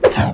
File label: attack